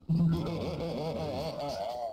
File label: haha; macedonia